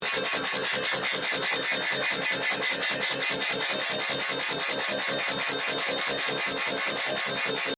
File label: Phaser Ray Vibrato, Scifi, Science, Fiction; Battle